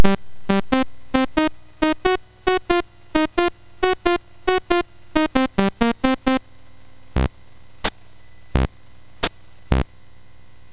MOS6581R3, GAMES; Strip Poker

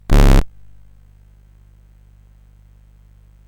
Dragonworld sid CSG8580R5

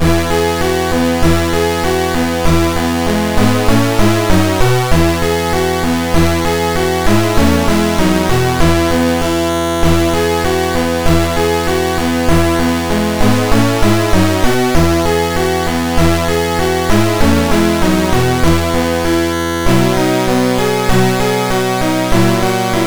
Chimes, MOS6581R4

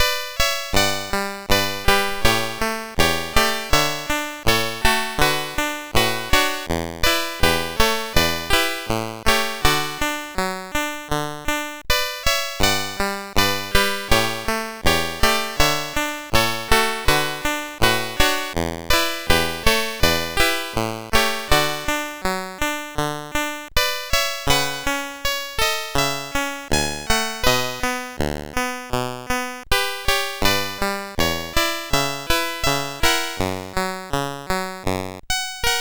SX 64 Demo sid CSG8580R5; Commodore

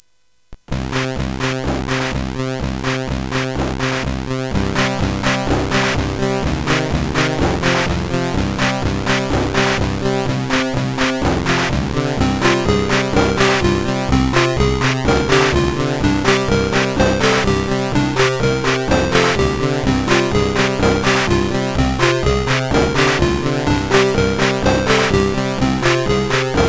Music, CSG8580R5

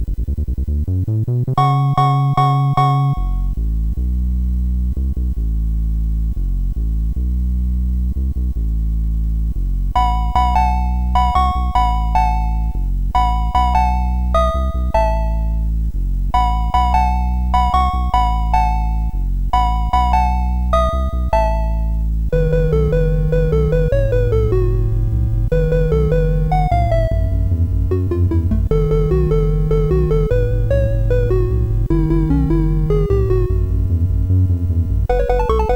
Subbass, MOS6581R4; hvsc

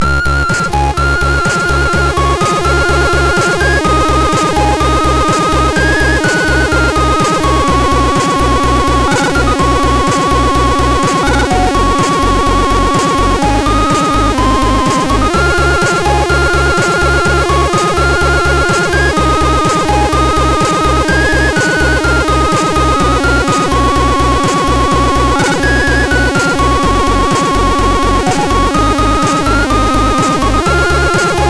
Eon sid MOS6581R2

